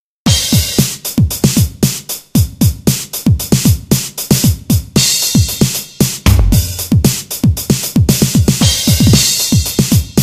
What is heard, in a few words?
analog drum